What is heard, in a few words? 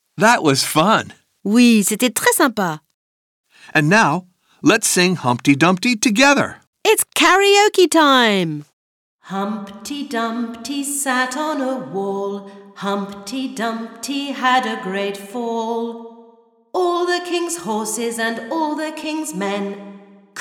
song Humpty Dumpty karaoke